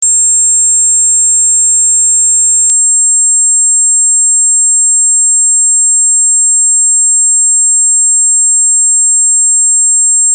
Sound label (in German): Dreieck 500 Hz; Dreiecktöne; Ongsiek Haustechnik